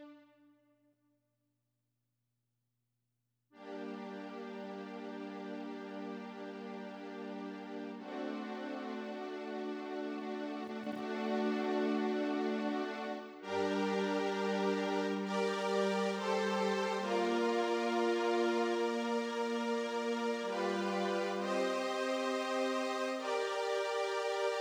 synth

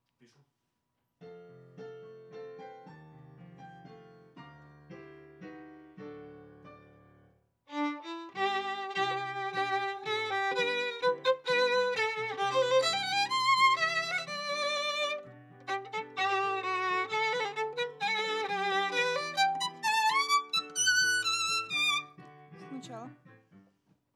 Viola D

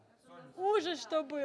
Vocal

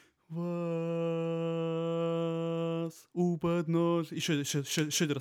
bass

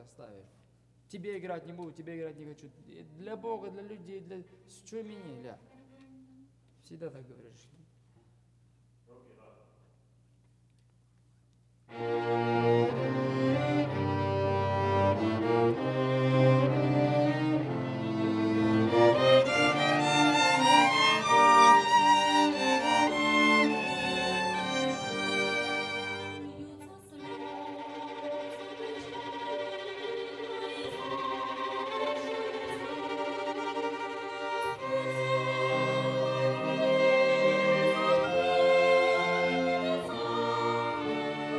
Overhead

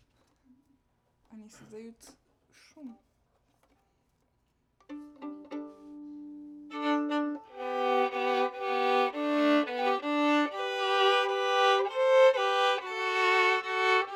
Viola C

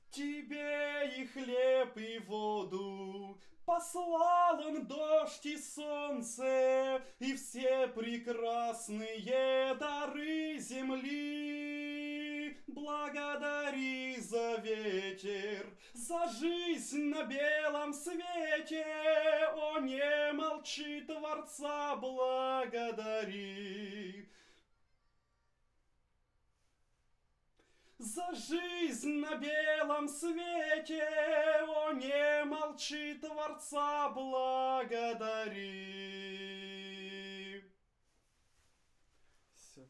vocal add condens

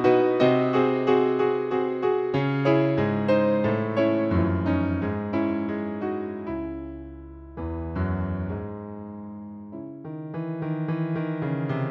Piano

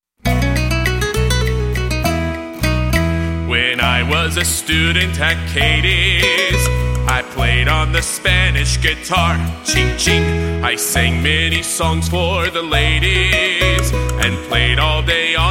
Spanish Guitar; A Beka

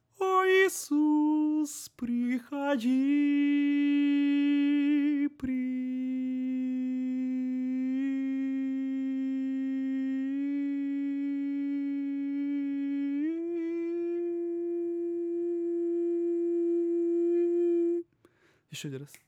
tenor

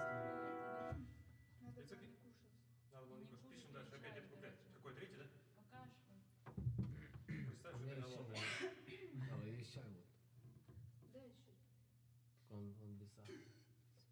piano

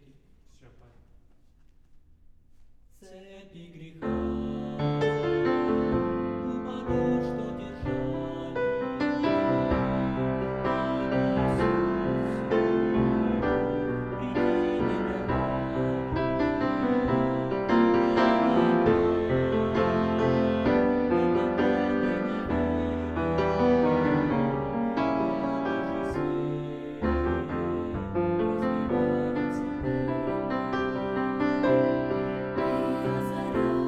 piano low

